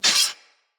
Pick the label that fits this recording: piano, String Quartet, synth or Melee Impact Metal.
Melee Impact Metal